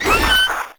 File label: Maggot Attack; Warframe; Proto Infested